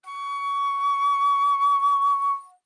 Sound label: Alto Flute vib ff Db6 stereo; Woodwinds